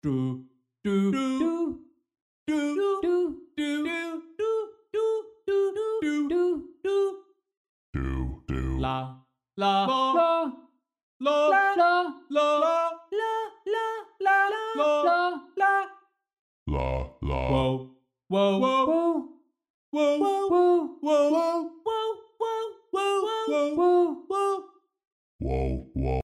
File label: Barbershop; Soundiron Questionably Barbershop